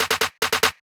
Snare Roll, 143 BPM; Drum Accents; Damage; Cymatics; GHOST (Beta Pack)

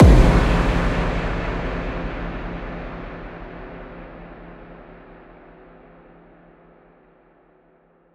Riddim Impact Explode; One Shots; Ghosthack; Riddim Essentials 2020

